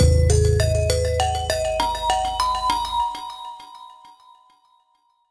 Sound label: Retry Jingle; Friday Night Funkin' vs. Tails.exe OST PC mod gamerip